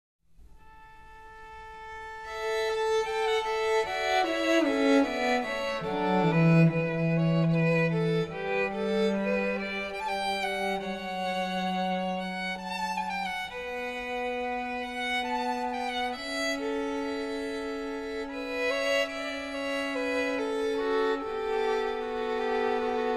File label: string quartet